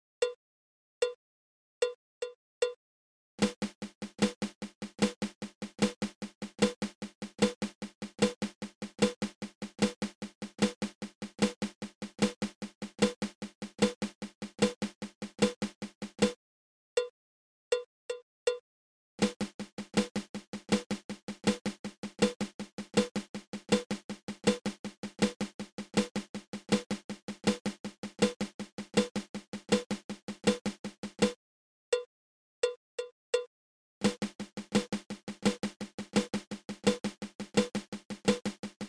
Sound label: Flammed Mill